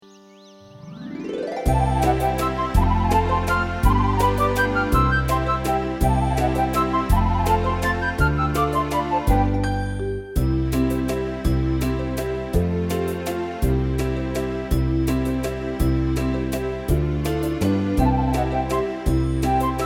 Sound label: karaoke only